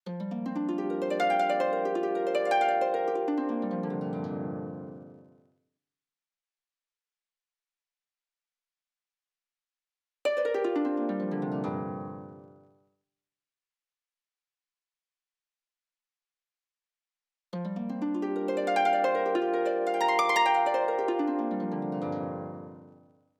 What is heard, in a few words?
harp A; Big Fish Audio; Legendary; Shes Mine